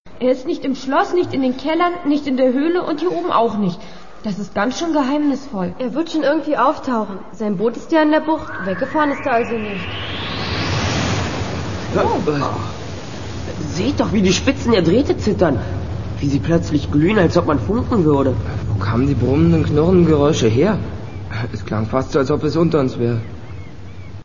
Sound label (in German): Funken